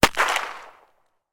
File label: weapon gun ruger single six; still north media